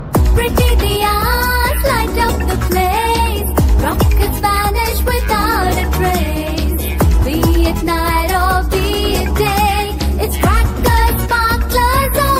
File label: new english diwali ringtone